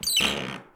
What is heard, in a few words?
Wood Creak Single